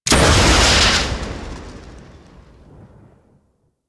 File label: Rocket Launcher Fire; Warframe; UT Weapons